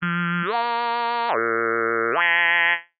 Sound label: Buzz